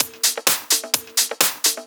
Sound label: Discohouse Loop 128 BPM